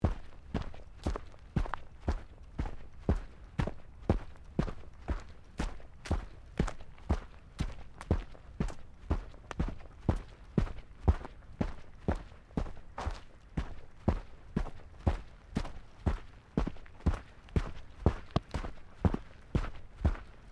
Footsteps Boots Walking Track Sandy Sequence; Footstep Foley; Footsteps (Sound Effects:Human)

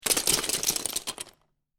Lego Drop Several Pieces Into Lego Box; Miscellaneous Toy Sound Effects

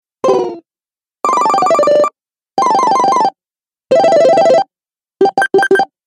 Retro Videogame Fx 5 Sounds Pack

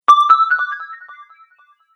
Magic Interface Sound; Magic Sound Effects

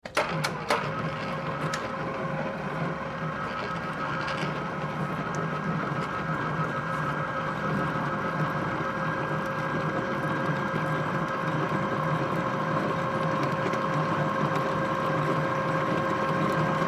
Drill Press Drill Hole Into Steel; Drills; Tools; Industry; Blastwave

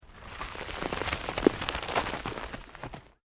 EARTH LOOSE MOVE LONG, Debris

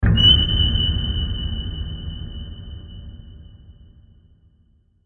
Production Element Hit Impact Screech Dark; Sound Design

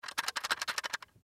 Alarm Clock Shake; Alarms; Electronics; Household; Blastwave

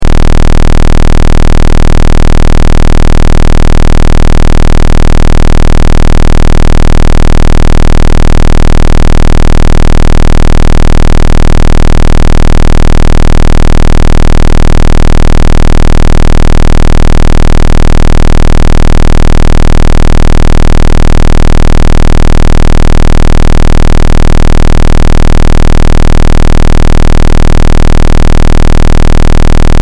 Test Tone Square; Wave 20Hz 60 Secs 0Db Loop

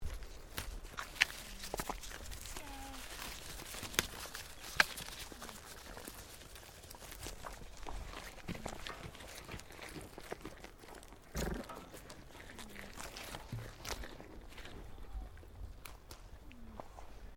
Animal Alpaca Female Eating; Alpacas on a farm in Gippsland Victoria; Miscellaneous Animal

